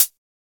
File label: HiHat